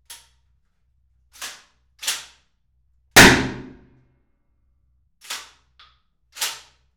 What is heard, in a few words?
Interior Small SPAS12, 2M Cubicle; AMBEO; Stereo; WEAPONS RECORDING PRIMER; AUDIOBEAST